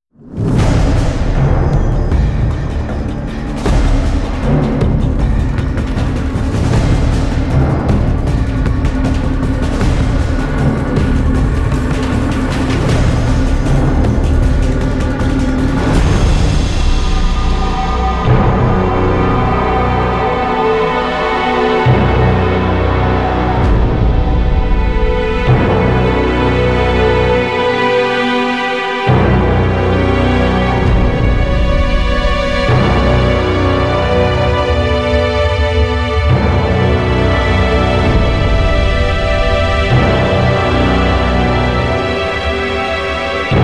chase end fight

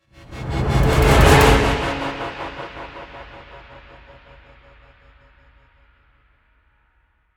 Triumphant Transition; Global Conversation

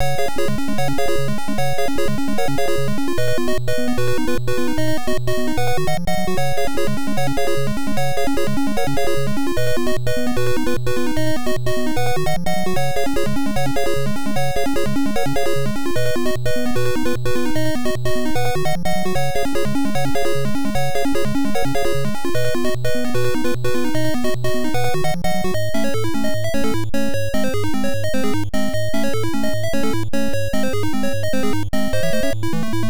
Full Power-Up; Star Soldier; nes

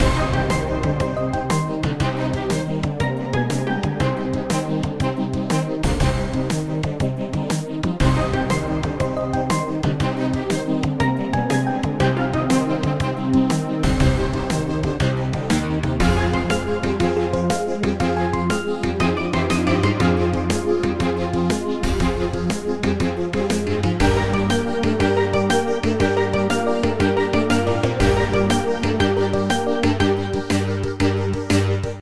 Bgmusic; Learn Math; NDS

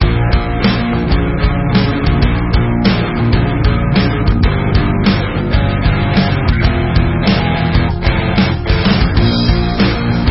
background battle; heroes saga android

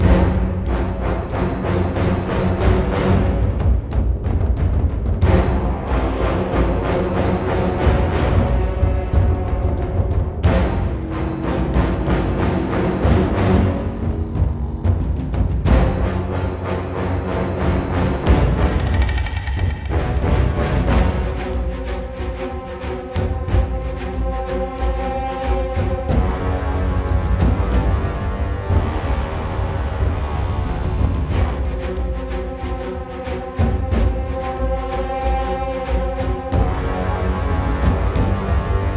Bossfight Stage Frantic; ryse son of rome 2014

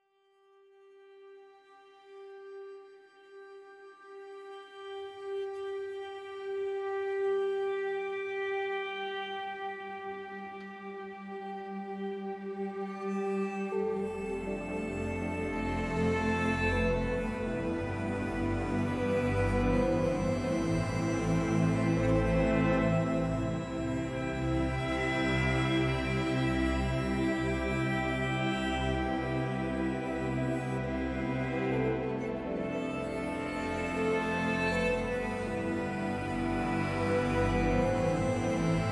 Exploration General Minimal; Horizon Zero Dawn; PS4

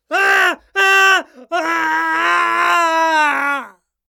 scream male

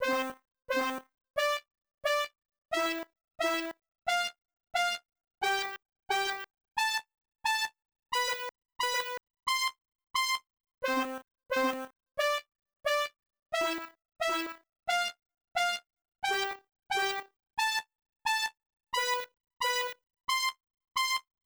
Sound Scale Check; konami gx arcade; Versus Net Soccer

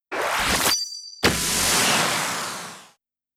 Splashdown

